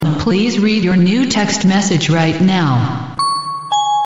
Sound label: airport sms